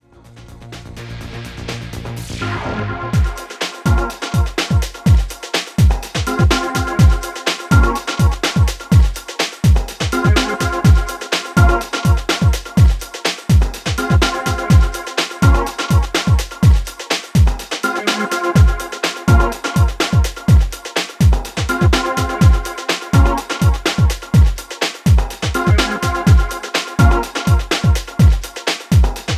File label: Organ Track